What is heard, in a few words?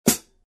13in Custom HiHats, cymbal sound